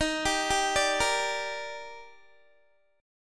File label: Eb Major 7th, Guitar; EbM7; arpeggiated